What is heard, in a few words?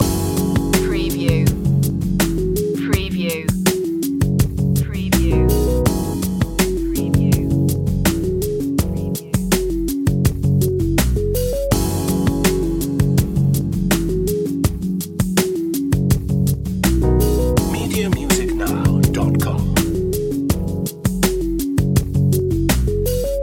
loop, chill